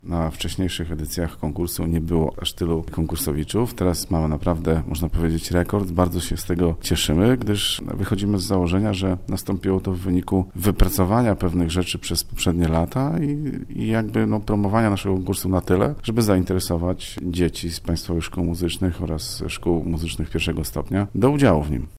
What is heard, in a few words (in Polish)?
gitara